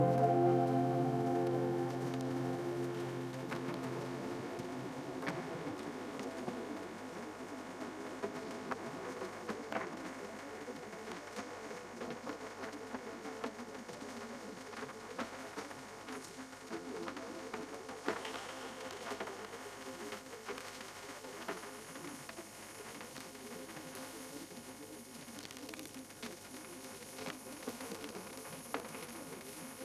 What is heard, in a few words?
ambient nature